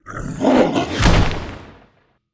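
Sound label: axe attack; monster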